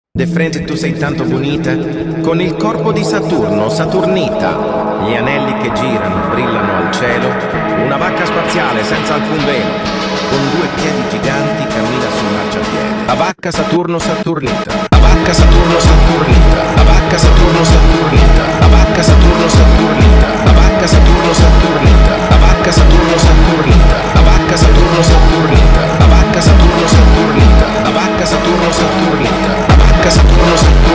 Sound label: Funk